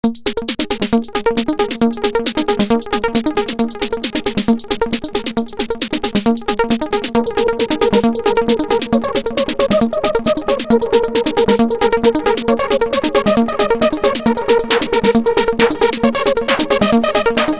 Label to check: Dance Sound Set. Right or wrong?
Right